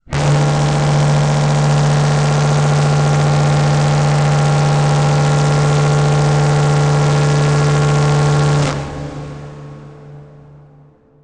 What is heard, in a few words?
Title Transition Stab Dark Long; Production Element; AdobeCC